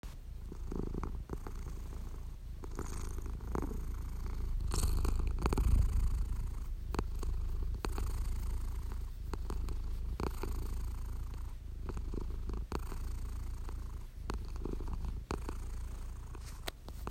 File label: cat purring